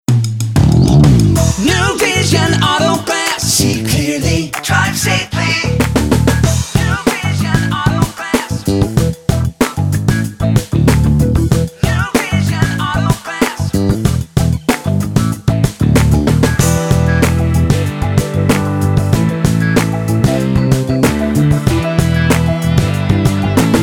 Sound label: Auto Glass, auto glass repair jingles; NuVision Auto Glass